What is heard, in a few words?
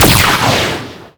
SFX Shoot; Skylanders Cloud Patrol; Arcade, iOS, Mobile; Ninjini